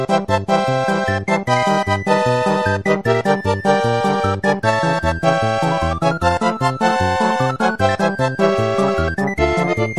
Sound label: end theme; smw